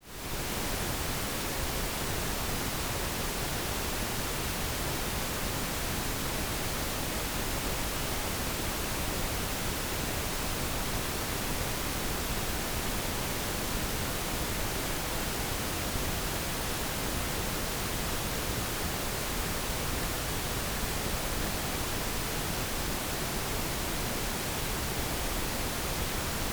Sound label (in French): bruit rose decorrele; phase0 4pistes